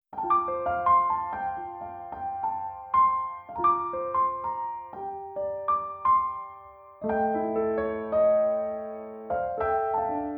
luxurious beachfront escape jazz relaxing music

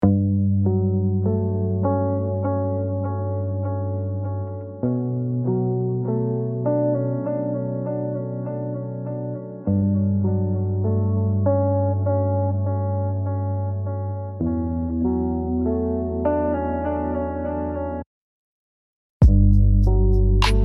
ocean lofi vibes lofi music

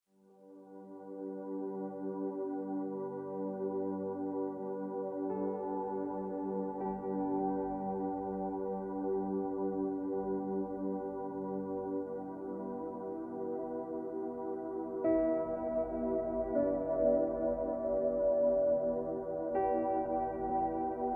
relax music

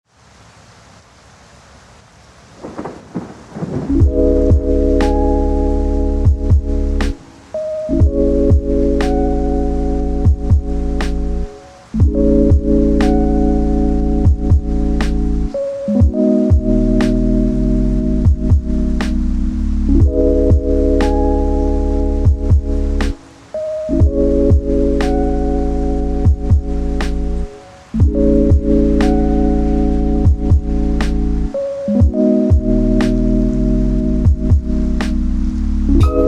reading during a thunder storm, lofi

